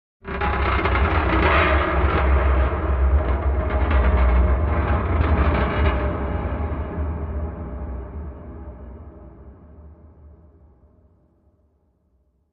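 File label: Large Object Movement, boat creaks & bangs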